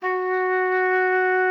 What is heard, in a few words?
F#4, OB D.AMORE; Xsample VOL-7